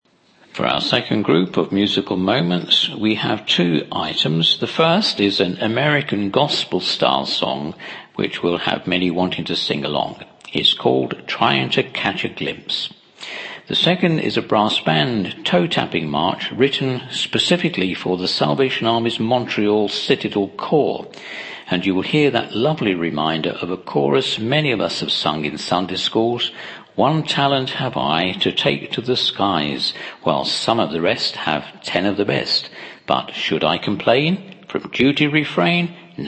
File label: Intro Music